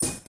hyperspace gem land

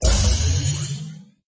lightning tube fill

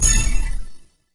combo

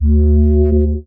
countdown warning

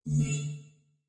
time bonus appears

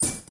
hyperspace gem land

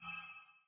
button mouse leave

